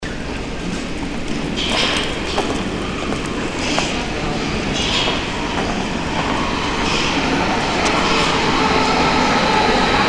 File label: subway arrival